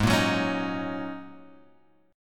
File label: G sharp 7th Suspended 2nd Suspended 4th, Guitar, Open F; strummed; 8,5,6,8,6,x; G#7sus2sus4